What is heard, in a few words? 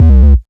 sub, sub bass; Techno ID; single-drums; subbassdrums